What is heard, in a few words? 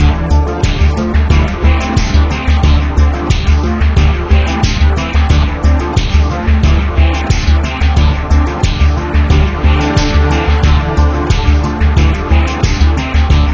Tension Loop; Sun Down